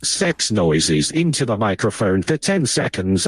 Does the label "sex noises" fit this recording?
Yes